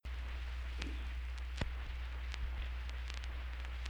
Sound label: recording noise; johnson